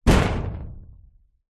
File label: impact metal door fist rattle; zapsplat